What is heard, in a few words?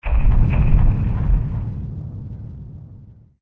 dun dun; Majoras Mask